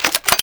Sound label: ar2 reload push; HL2-Nerv; Fisher